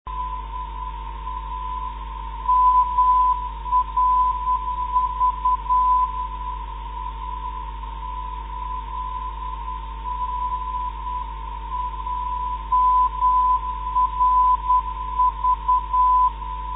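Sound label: mrv 404khz, non-directional beacon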